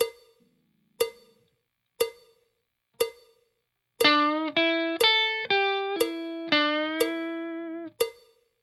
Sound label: blues tricks slow, guitar